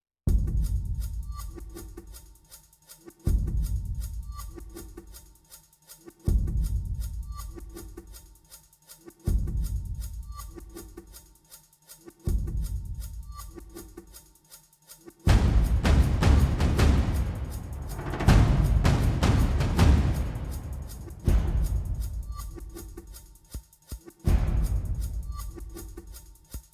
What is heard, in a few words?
Perc Only; Brand X Music